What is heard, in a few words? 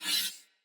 Dagger Whoosh Accent Heavy, Swords; Weapons; Player; Josalfar